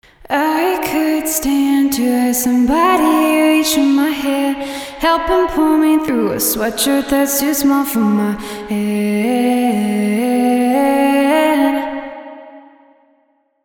wet vocal

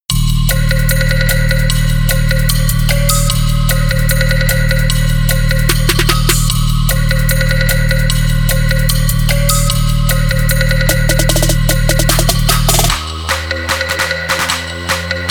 EDM TRAP

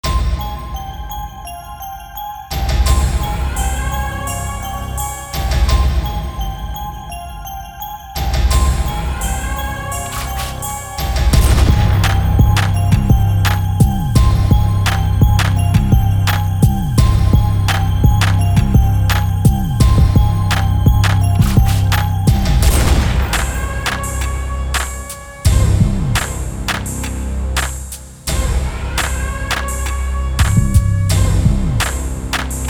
85 BPM

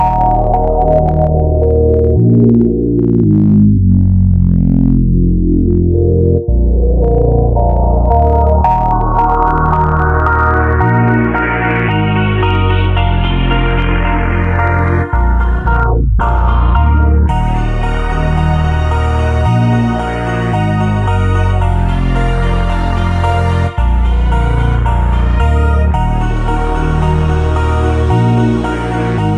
Vintage Hip Hop

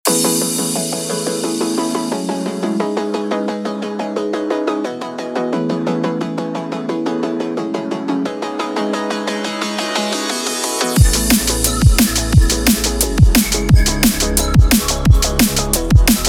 Dirty DnB

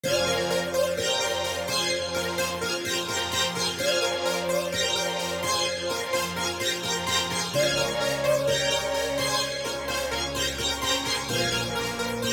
Trap

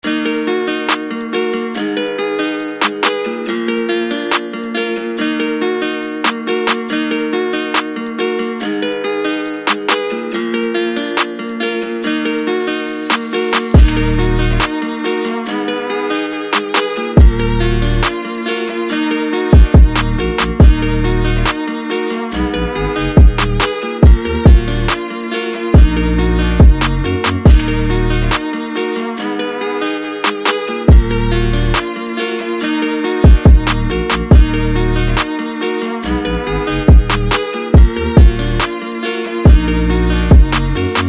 Drill

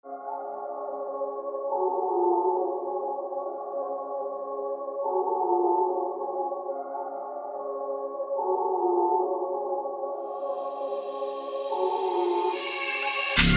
Drill

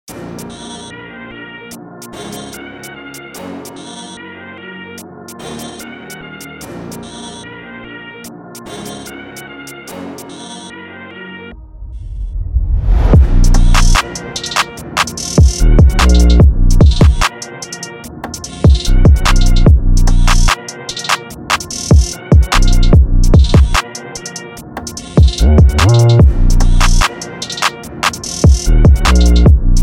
DRILL

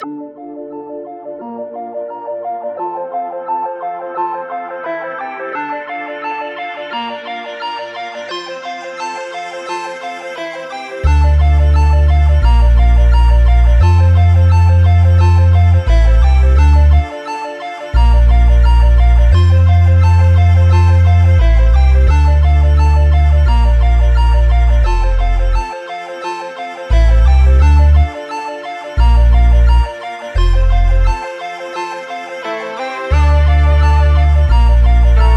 Vintage Hip Hop

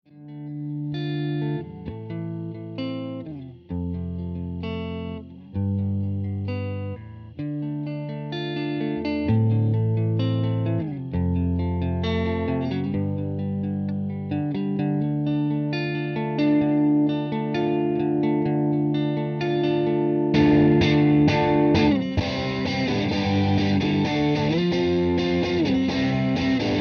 Guitar Dry

